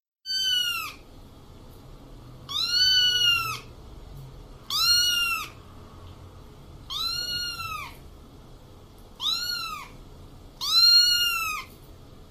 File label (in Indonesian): suara kucing anak; burungpedia